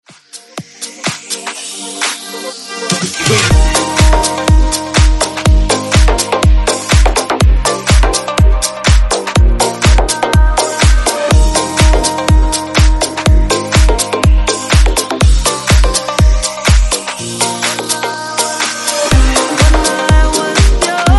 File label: Dance Remix